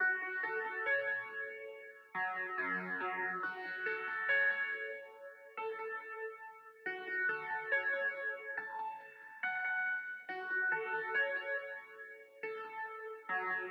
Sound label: darkspaces f min 140 bpm